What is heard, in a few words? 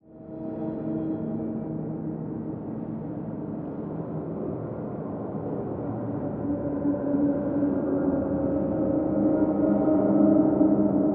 cave; ambiance